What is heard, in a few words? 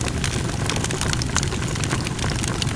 fire